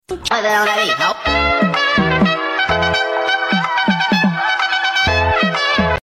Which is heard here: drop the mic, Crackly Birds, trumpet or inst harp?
trumpet